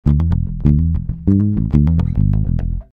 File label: thumb mute, aria